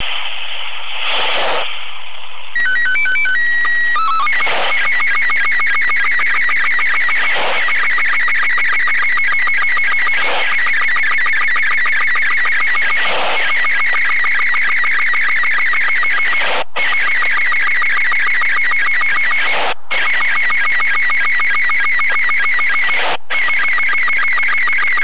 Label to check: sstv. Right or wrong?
Right